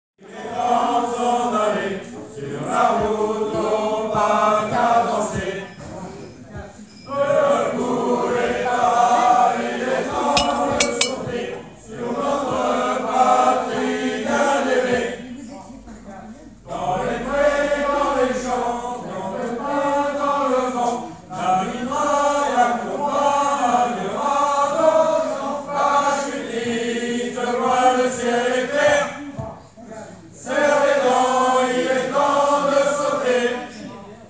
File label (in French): chant